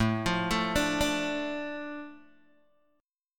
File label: A Suspended 4th Sharp 5th down Guitar Open G